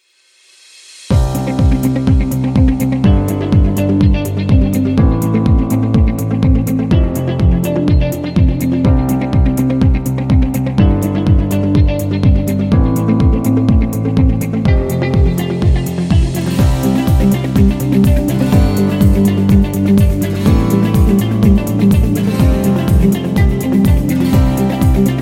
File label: On Hold, corporate music; corporate music vol 20